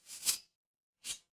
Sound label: impact sword draw